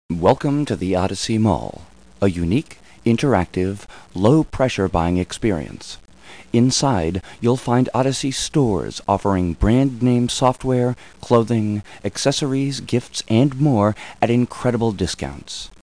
om; Odyssey Incentive Vacation Travel Planner

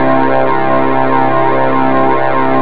seal, synth

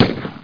hood gun; qdemo

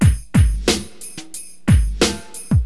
Drums loop, HipHop; LiveAct Pool

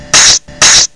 scrach; Hunt for Barney

